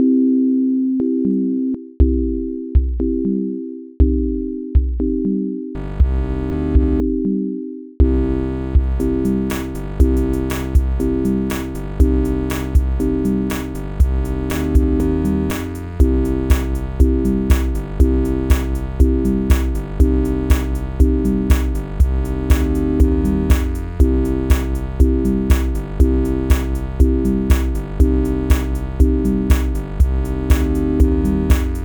the real sine

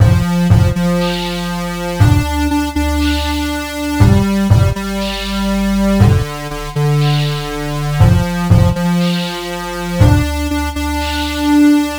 Sinewave

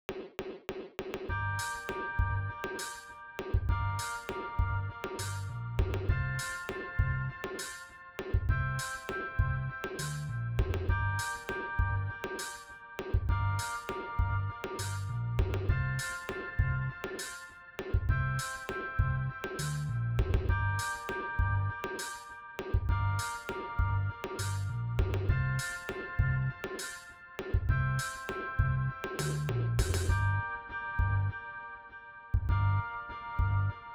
Sinewave

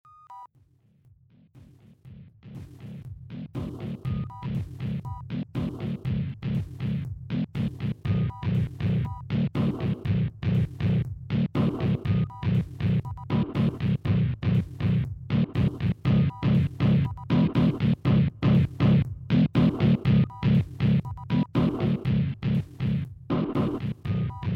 Sinewave

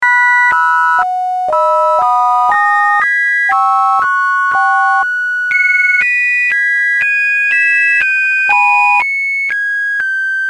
sine wave

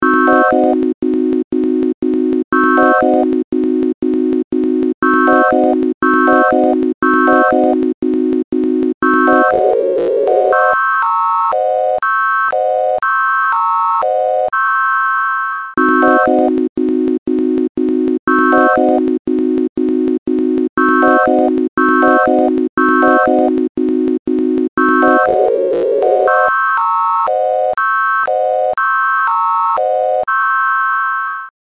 sine wave

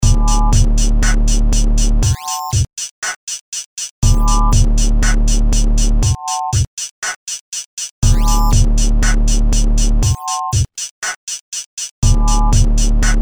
Sine Wave

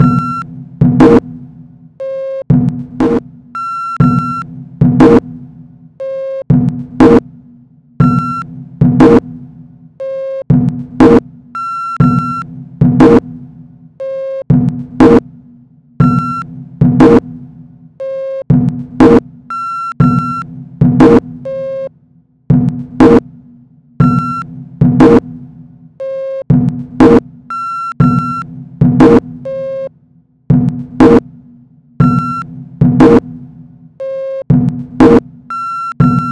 sinwave

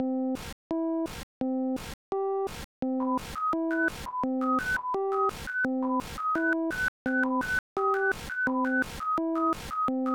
Sine Wave

